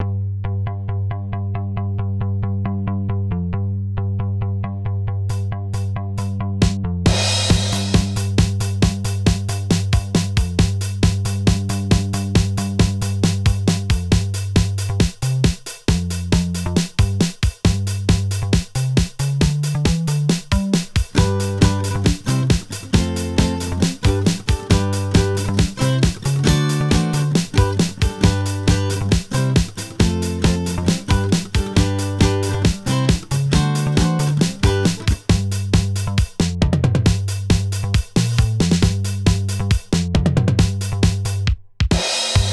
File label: poultrygeist instrumental; FAWM 2023